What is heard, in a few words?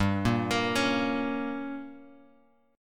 G Diminished; Gdim Chord {3 1 X 3 2 X} arpeggiated